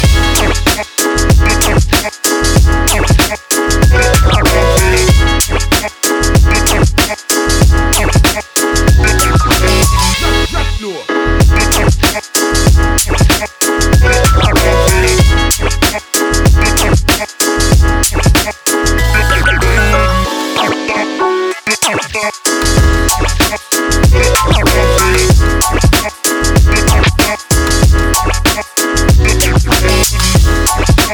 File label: upbeat background hip hop loop